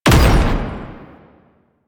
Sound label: Perfect Heavy Attack Impact; Warframe; Weapons; Melee